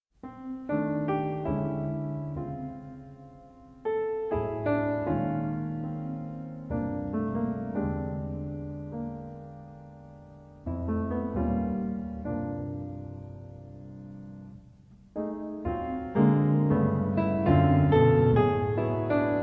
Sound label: written piano solo